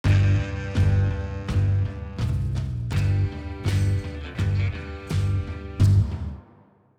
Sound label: MUSIC; RESIDENT ALIEN; HULU PREMIUM SLATE